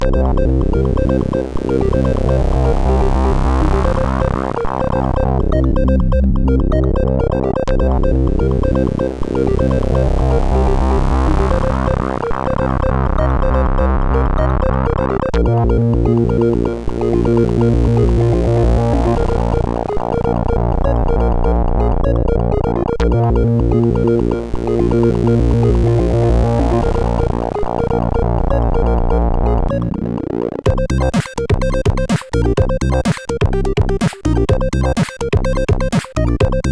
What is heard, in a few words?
sid CSG8580R5; Pocket Tomb